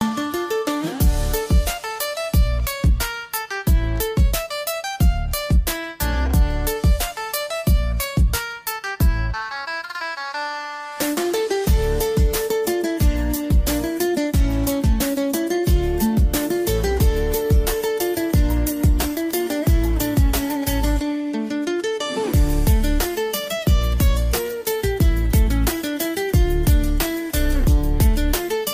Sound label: flamenco dark pulses, ringtones